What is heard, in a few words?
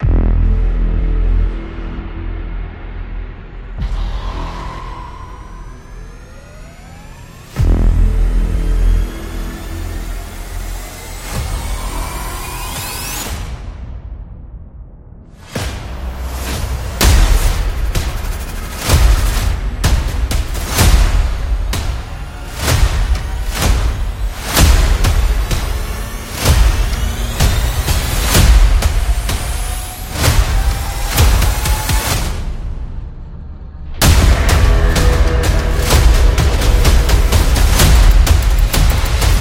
A Trailer